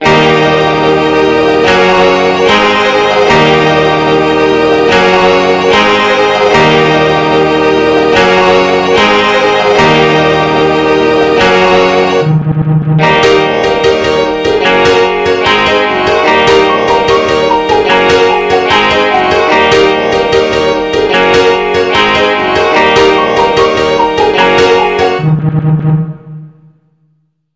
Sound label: Country Version